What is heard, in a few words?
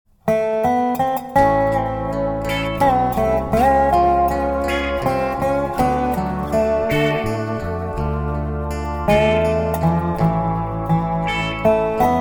Blues